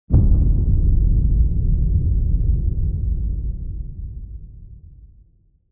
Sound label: Horror Accent